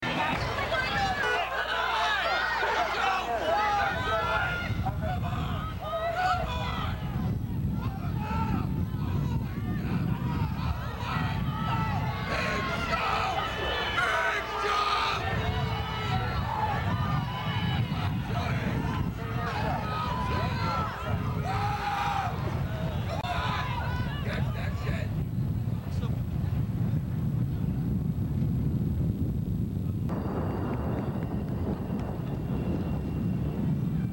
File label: boss scream